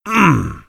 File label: heavy meleeing; tf; duke